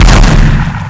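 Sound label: double barrel, shotgun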